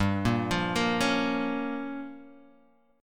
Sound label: G Minor 7th Flat 5th